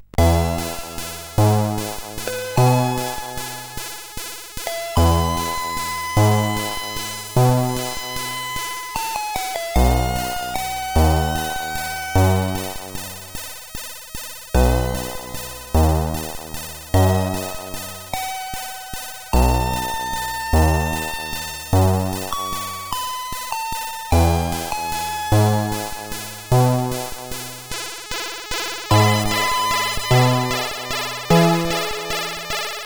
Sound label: Something Classical, CSG8580R5